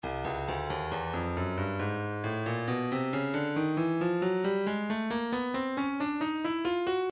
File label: breakbeat midi files 065 069 bpm; Mosaic E; Stylus Breakbeat Midi Files